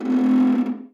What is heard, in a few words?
cable stress; ambient; dust2